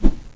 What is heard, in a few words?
zombie whoosh; doom3